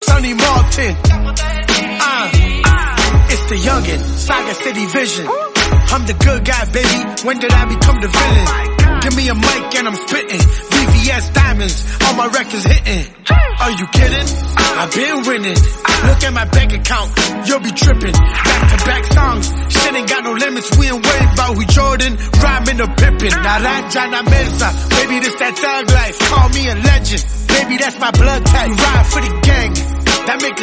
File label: Rap